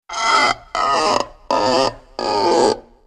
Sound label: cartoon squeek